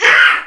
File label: mulit scream